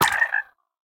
frog death; Indev+ 0.3 Portable

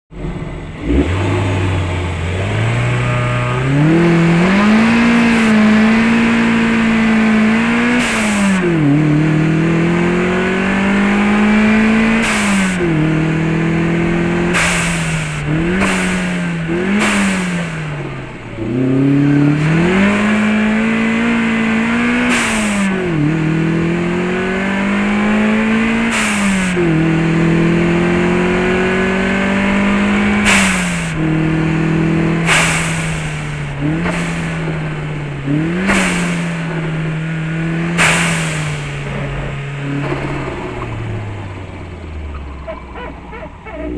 Boost Sound; Initial D 5th Stage Sound File; Initial D Music Lossless Collection

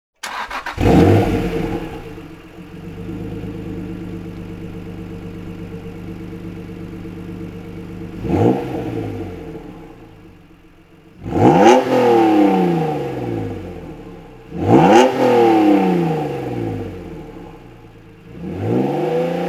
Ignition Exhaust Sound

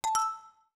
Windows Logon Sound; Pearl

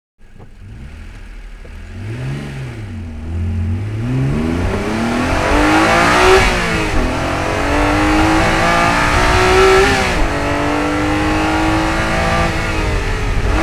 Boost Sound; Initial D 5th Stage Sound File; Initial D Music Lossless Collection

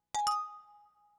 Windows Exclamation; Pearl

